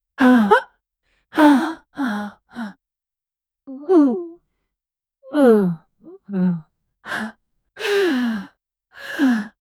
aggressive demonic female, human vocalizations

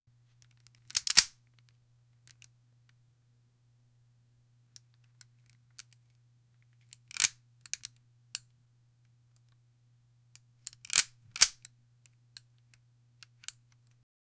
revolver gun revolving; firearms

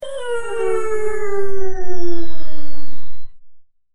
surprise woah sparkling g; sparkle

